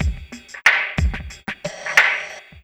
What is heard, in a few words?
Clink, Synchro Funk, Beats; Sonic Foundry (Sony Creative Software)